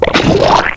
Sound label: SPIT SPLAT; BANZAI